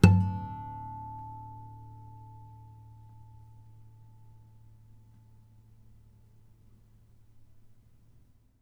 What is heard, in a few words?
harmonic, strings harmonics